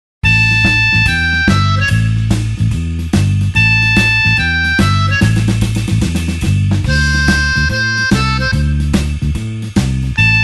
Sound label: blues harp shuffle A